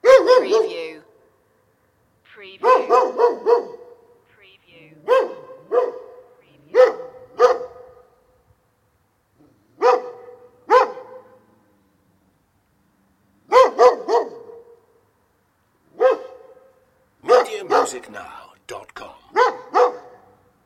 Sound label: close dog barking loop